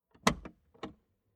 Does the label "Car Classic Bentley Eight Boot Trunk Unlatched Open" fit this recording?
Yes